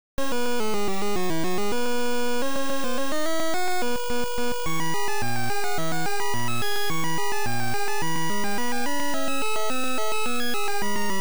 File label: Title Beeper; Tomas Rylek; Star Swallow